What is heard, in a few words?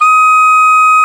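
Soft Soprano G#3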